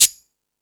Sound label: Percussion, Drum Machines E-MU Pro-Cussion